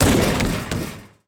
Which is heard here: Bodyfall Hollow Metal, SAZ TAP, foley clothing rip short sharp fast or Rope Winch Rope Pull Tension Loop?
Bodyfall Hollow Metal